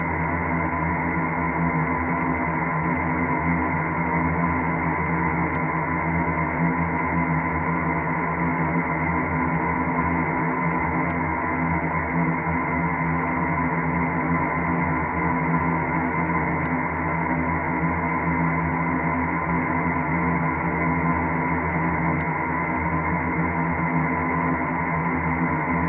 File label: Drone Two; SoundChaser; CerebralAudio